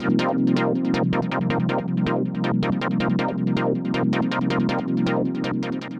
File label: synth, ambient, 160, C; Keys; eJay